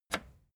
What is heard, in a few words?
record player play release